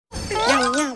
Meow Meow

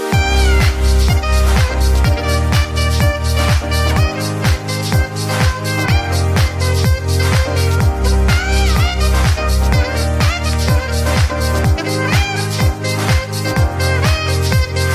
Shreksophone chorus

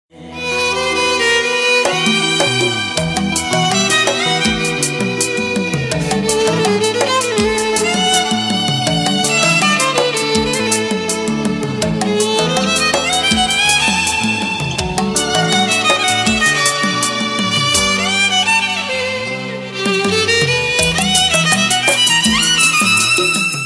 Romentic Voilen

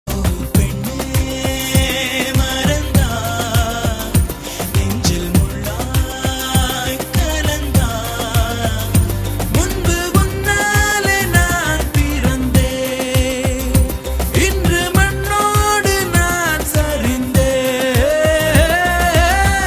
Sad Love

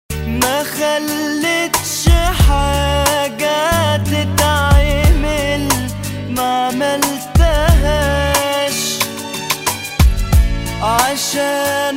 Shaker

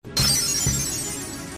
heal potion; ffx

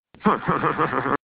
Stupid Laugh

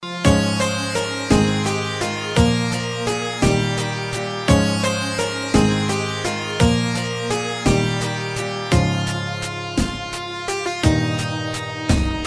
8bit

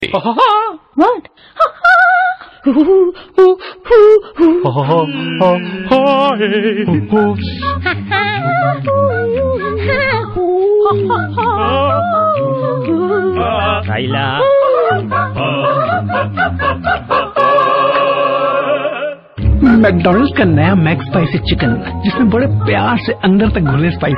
Funny Tone

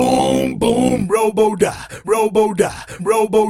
Robot Sms

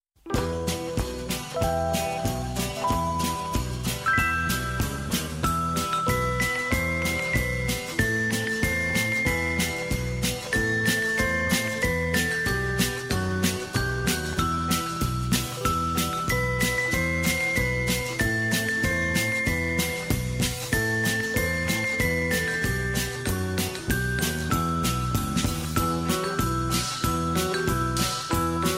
Turkish Classical

